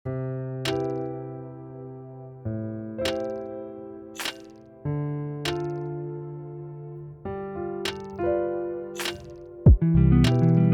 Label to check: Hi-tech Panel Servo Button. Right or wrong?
Wrong